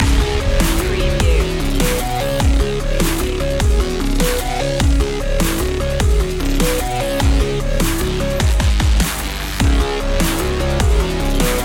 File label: hybrid human loop